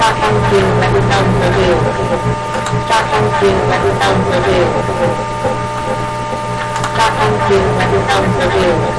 isochronic signal 15 Khz with embedded message into 1 signal